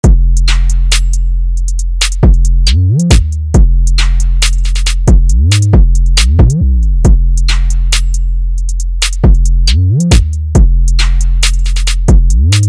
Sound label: Drill Loops